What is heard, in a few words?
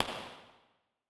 Reverb